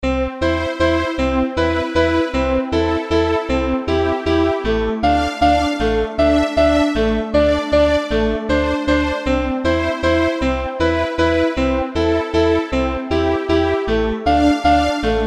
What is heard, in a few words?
tone vals, ringtones